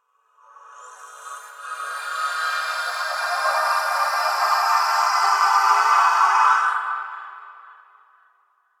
magic spells cast long air charm; sonniss gdc

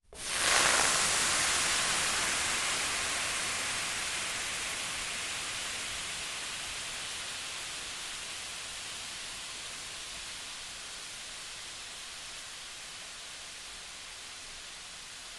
sizzle water on pan medium; Sonniss GDC

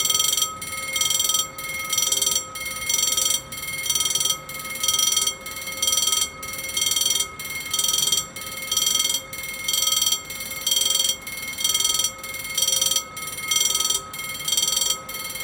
railroad crossing signal bell loop; fvtt sonniss gdc audio

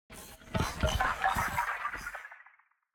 designed rocks texture granular

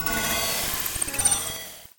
granular texture futuristic; sonniss gdc

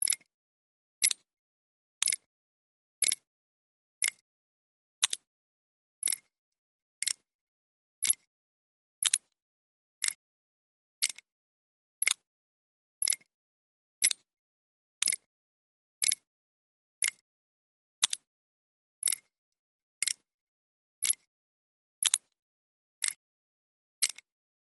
tick

